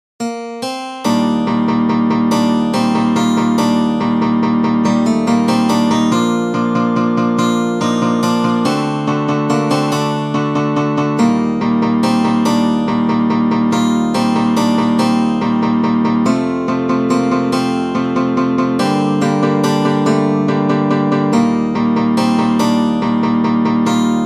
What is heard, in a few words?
guitar; octopath traveler